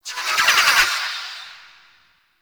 Equip Screams; Noctua; Warframe; Dante

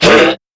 Pain Crewmen; Warframe; CorpusCrewmen; CrewmanA

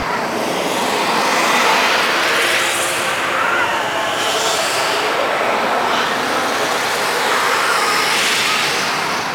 Gas Dust Loop; Warframe; Corpus Gas City; Ambience

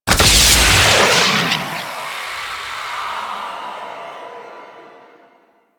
Oro Void Portal Sabotage Device; Warframe; Ambience; Orokin; Oro Sabotage Objective

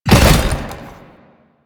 Motorcycle Collision Fast; Vehicles; Warframe; Motorcycle Ninety Nine

